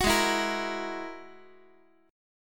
G sharp 7th Suspended 2nd Sharp 5th, Guitar; G#7sus2#5; strummed; JGuitar

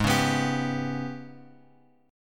G sharp Minor Major 7th Double Flat 5th; G#mM7bb5 Chord {4 4 X 4 X 3} strummed

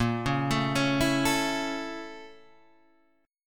Bb Minor Major 7th Flat 5th, Guitar, Standard; BbmM7b5; arpeggiated; 6,4,7,6,5,5; JGuitar

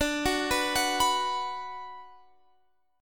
F sharp Suspended 4th Sharp 5th D

